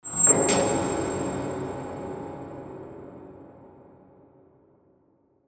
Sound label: cell door close